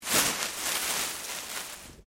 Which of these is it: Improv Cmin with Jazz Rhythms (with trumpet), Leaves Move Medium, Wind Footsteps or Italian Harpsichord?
Leaves Move Medium